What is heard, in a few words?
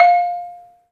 MARIMBA, F; 3D Sound; 3D Keys VOL-1